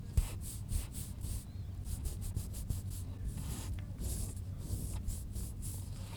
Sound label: Itchy Skin